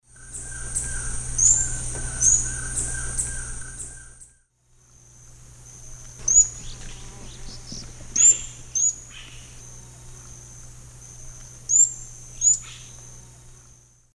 Picumnus lafresnayi, calls; S. America; Eastern Lowlands

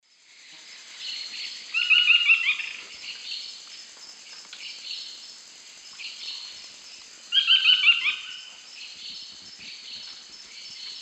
Automolus ochrolaemus, songs 2 birds; S. America; Eastern Lowlands

